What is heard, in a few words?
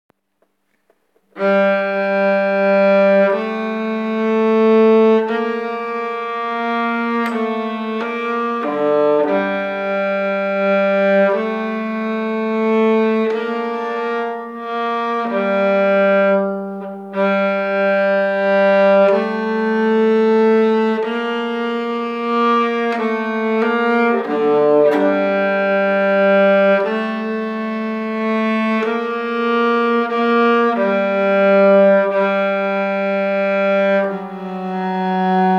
polonas fran Skane voix, nyckelharpa; octobresaintdie